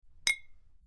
glass hit

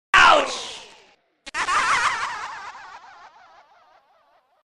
ouch

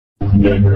robot snickers

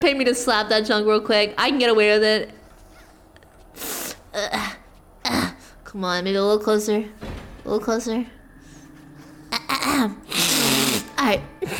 sniffing; booty

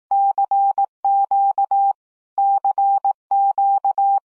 alarm; finland

